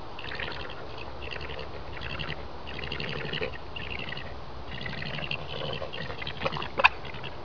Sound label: churr